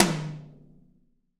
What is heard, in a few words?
TOM, Real-Kit; 90 s Sample CDs; Sound & Vision - Gigapack I CD 1 (Roland)